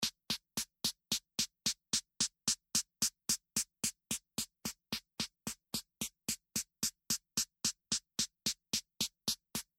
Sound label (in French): Snare